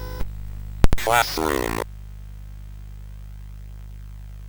sid CSG8580R5

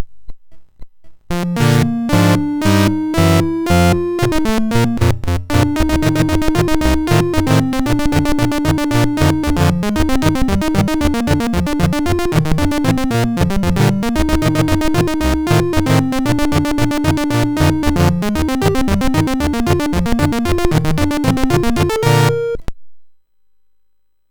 sid MOS6581R4; O Rileys Mine

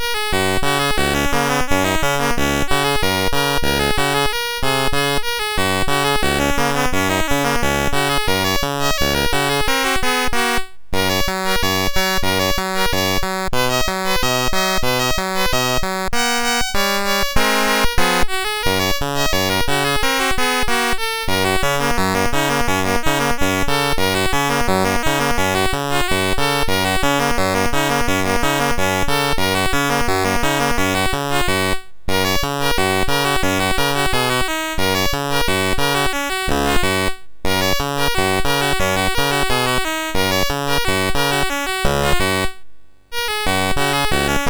Digi Hits, MOS6581R4

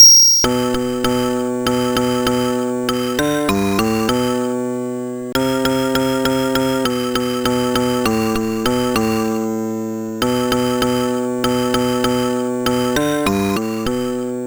Jingle Bells Box, Music Box

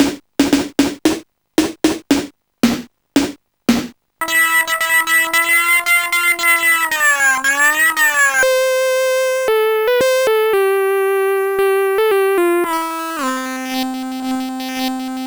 kookaburra; Sonix Music Driver

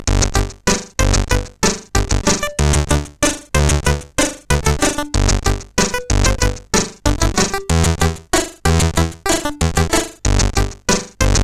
sid, CSG8580R5

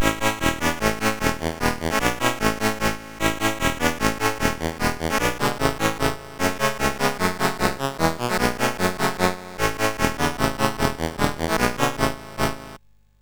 Rocket Ball, MOS6581R4

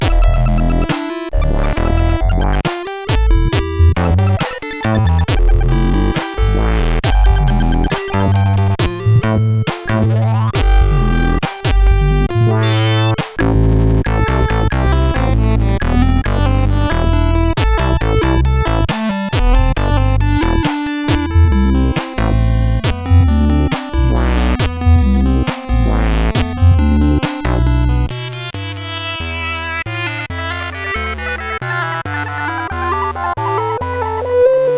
sid, CSG8580R5

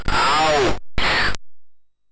sid MOS6581R3; Out on a Limb

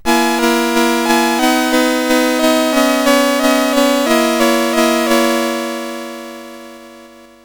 sid CSG8580R5; Plaque Man

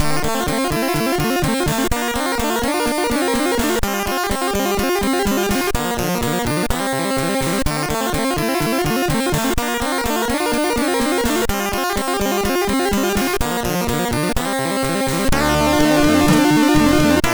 sid, MOS6581R2

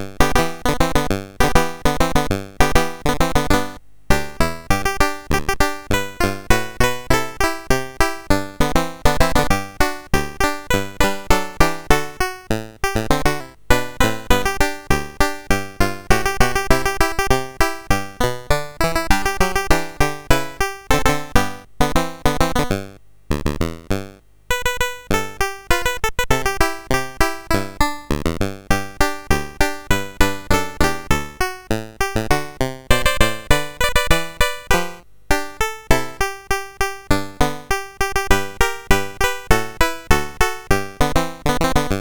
CSG8580R5

